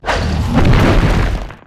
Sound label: Attack; Eldcrochi; Mob